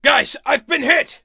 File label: wound; darkwing